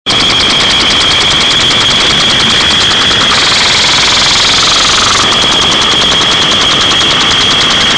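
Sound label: lawn sprinkler, tools; Easy Personal Web Page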